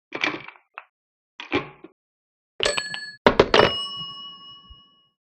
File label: Phone Movements